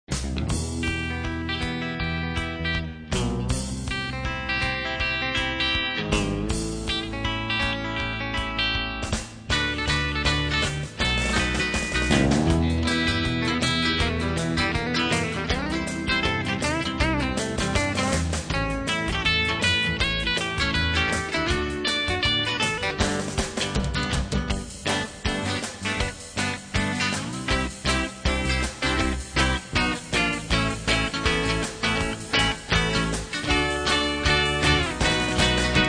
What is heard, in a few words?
Instant Guitars